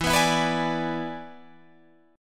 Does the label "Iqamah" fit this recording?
No